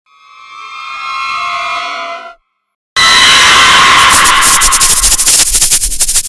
creepy sounds horror sounds scary sounds horror creepy